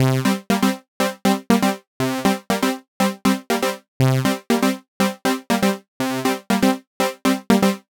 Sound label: Chord Synth Cm, 120bpm; Zero-G; Extra Chord Progs